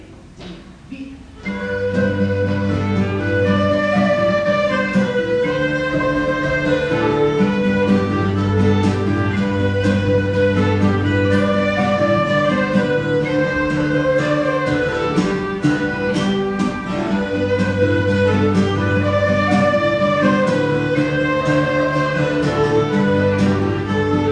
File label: polka